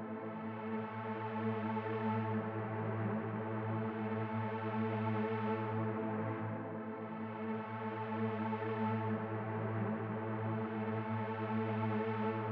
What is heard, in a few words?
Harsh Psy Atmo F